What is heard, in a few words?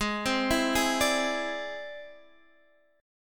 E Augmented 7th G sharp